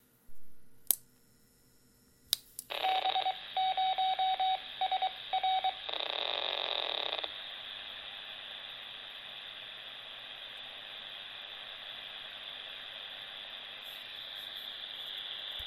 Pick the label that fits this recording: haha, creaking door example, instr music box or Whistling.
creaking door example